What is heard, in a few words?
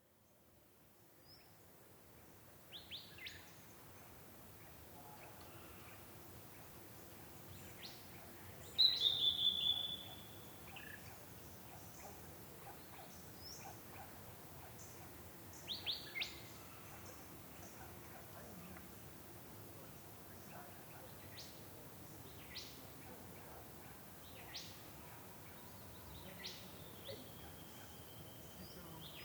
dusk cockatoos people; Creek bed, Lerderderg State Park on Wurundjeri Country, Australia in May 2025; by Camilla Hannan; Last Sunday, I went field recording at Lerderderg State Park on Wurundjeri